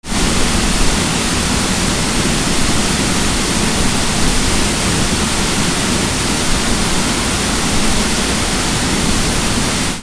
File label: WATER